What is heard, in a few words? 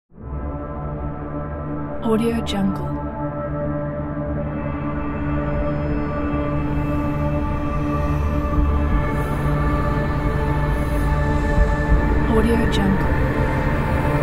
drone rising; archisound